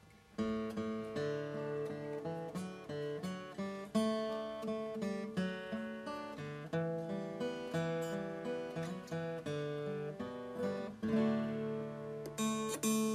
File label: Simple Gifts by dulcimers and guitar